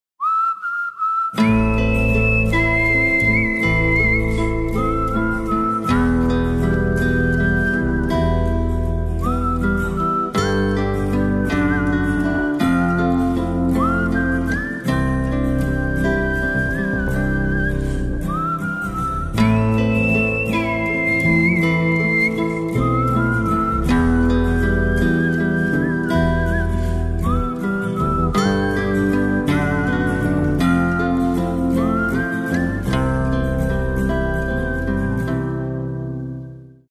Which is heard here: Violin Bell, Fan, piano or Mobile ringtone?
Mobile ringtone